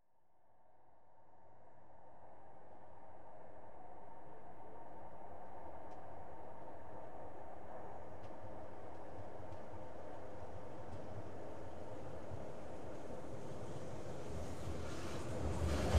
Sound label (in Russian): tramvaj priblizhaetsya na ostanovku ozhidaet uezzhaet